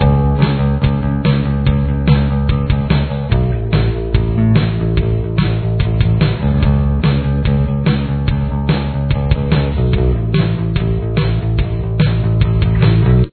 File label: bass